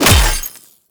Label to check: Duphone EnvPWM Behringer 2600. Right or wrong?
Wrong